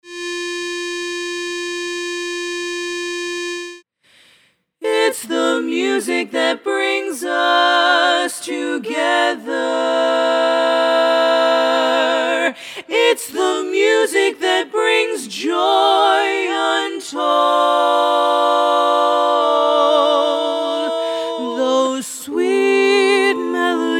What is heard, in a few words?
Learning Track Bass Missing